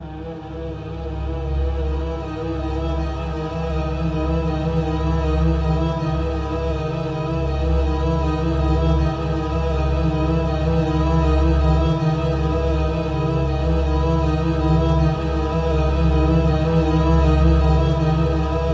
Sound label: Only Strings